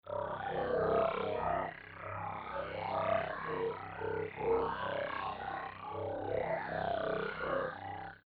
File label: Device Robot talking